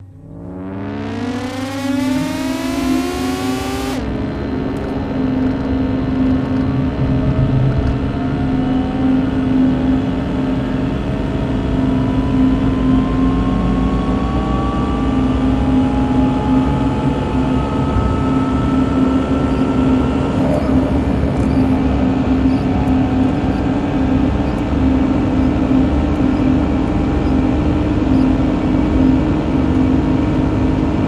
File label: Mars Taxi Buzzes, spaceship